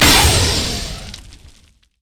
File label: power ball; robo rammus